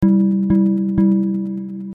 q piano

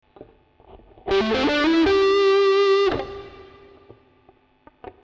RAT

